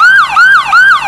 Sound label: yelp, sirens; whelen gamma